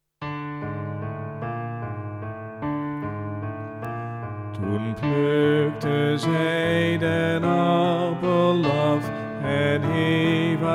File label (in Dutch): lied met zang; kerstspelen; Toen plukte zij den appel af